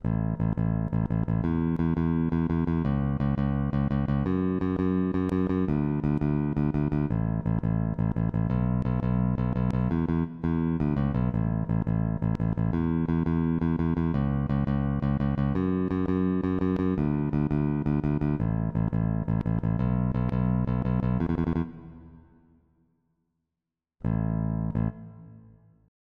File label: bass